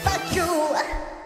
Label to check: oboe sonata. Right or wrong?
Wrong